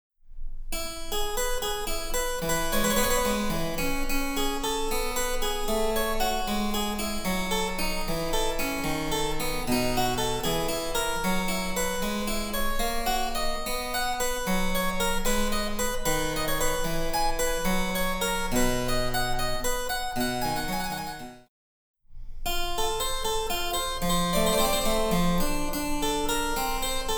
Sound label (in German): Cembalo; Mark Lindley WTC 1